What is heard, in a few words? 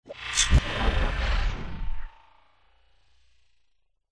robotic power hum sci fi robotic robot cyber futuristic energy machine drone